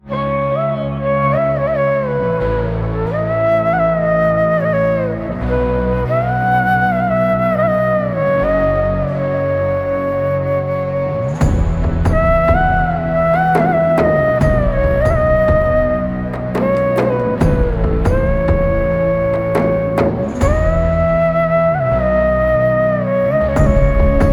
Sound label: Flute Music Mix